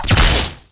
blaster impact; weapons; Tribes2

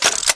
chaingun activate, weapons; Tribes2

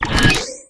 health patch; Tribes2

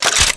blaster activate, weapons; Tribes2

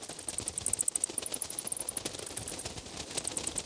ambient bats; Tribes2; ironsphererpg

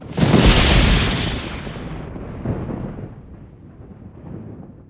melody; Tribes2; environment

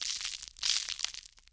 sand patter; environment; Tribes2

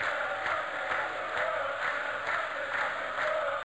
crowd

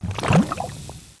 lava bloop; environment; Tribes2

